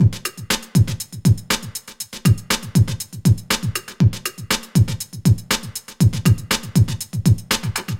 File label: NRG Break, Trance, Drums, Breaks